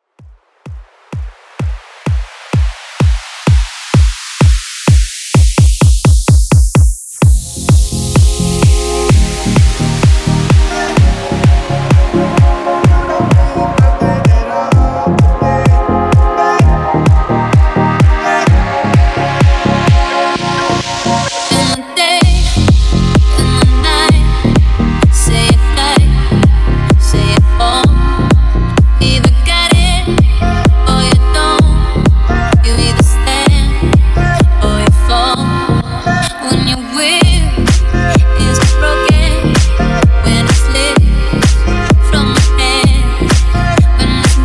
Club Extended 128Bpm

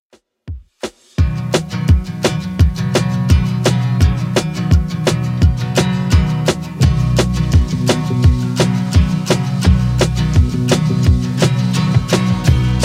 60s Redrum 170bpm

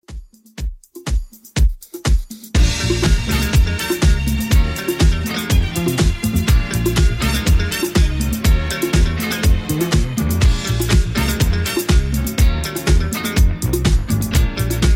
80s, 122 bpm; Redrum

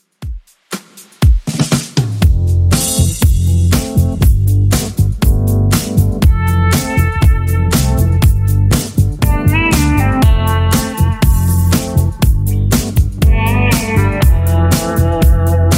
2ks DJ Friendly Redrum 120bpm

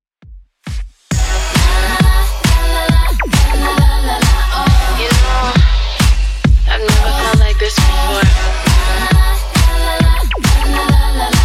2ks Redrum 135bpm

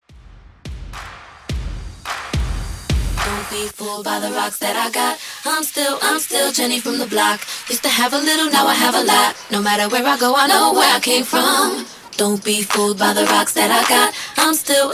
Acap Out Top40 107 BPM

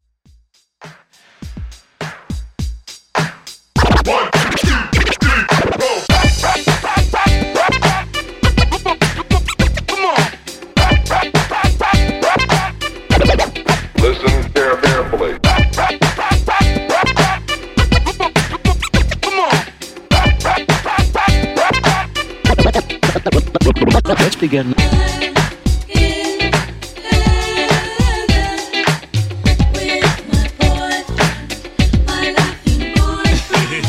Funk Scratch, 102 Bpm

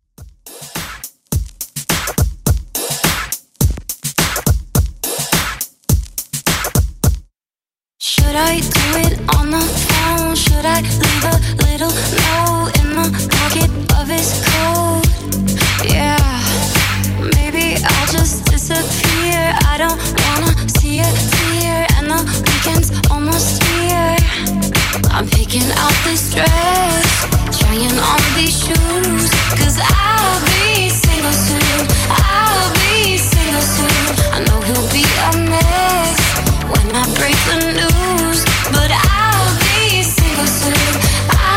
Funky Redrum 105Bpm

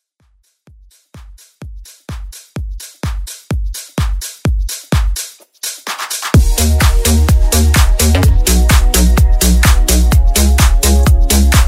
Club

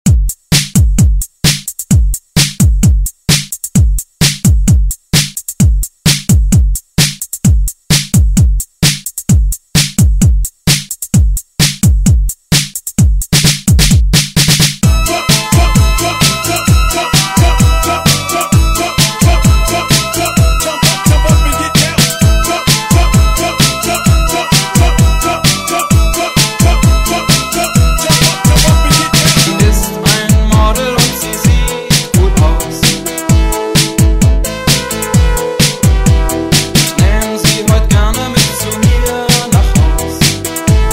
Party Hype Redrum; Deejay Hit

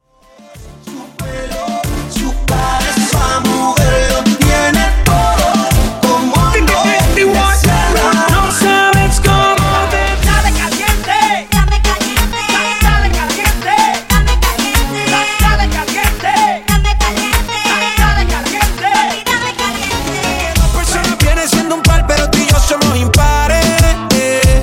Party Megamix 93 Bpm; CleanDirty

